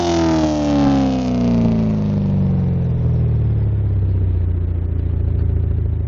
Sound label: car decelerate; BALLY